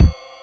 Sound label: Rhythm Kick + Ride, Realistic Concertmate-670; Layered Kit